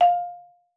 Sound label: marimba F5; Xsample VOL-4